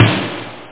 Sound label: rocks